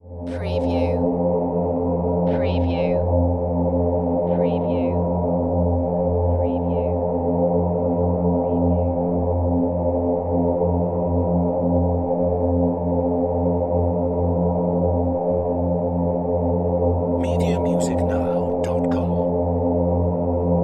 Drone Vox Choir Low; Sound Effects Bible

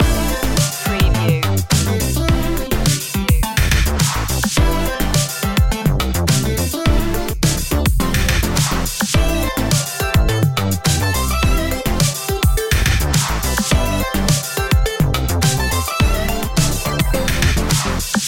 beat machine loop

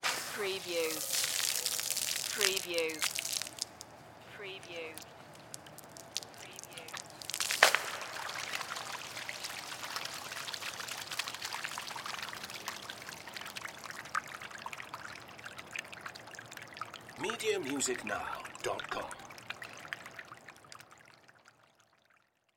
Fire Hose Empty Water

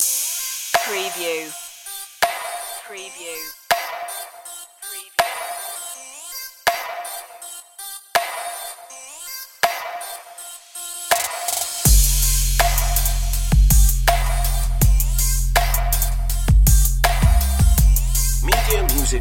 trap this underscore

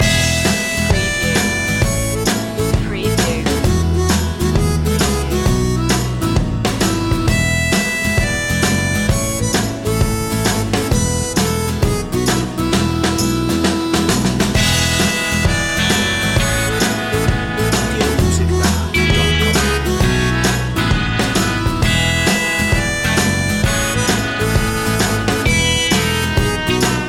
sixties flower power

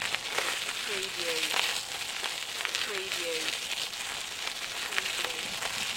Bicycling on gravel road (loop)

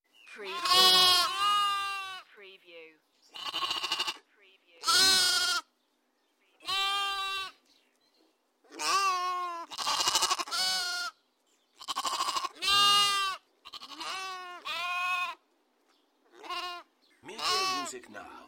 Lambs bleating short; beanstalk audio

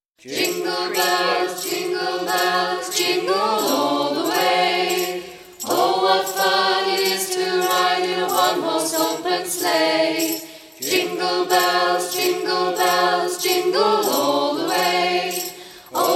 jingle bells choir christmas jingles

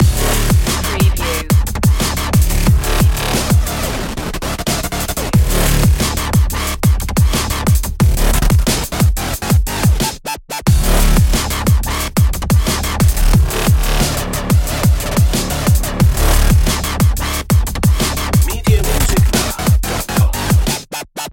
droid shuffle loop

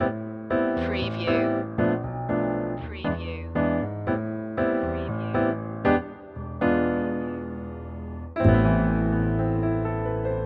bossa stinger

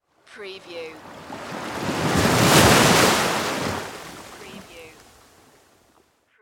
Ocean Waves Crash

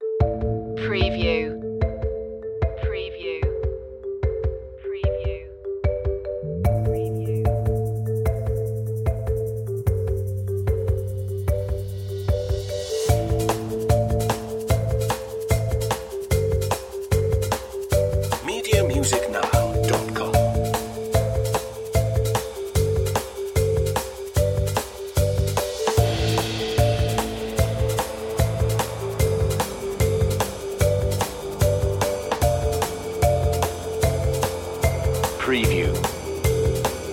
redirect underscore no bells no xylo

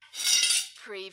Drag Tools

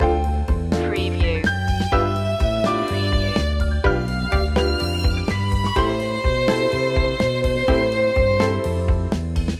carefree day out loop

